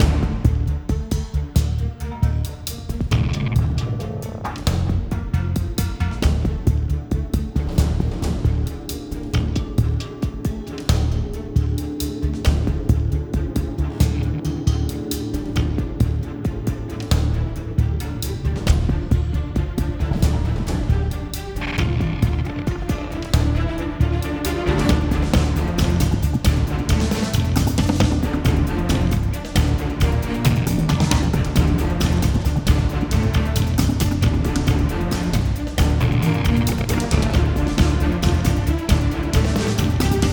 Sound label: Battlefield Loop; Mars Sortie (Arcade) (gamerip) (2018)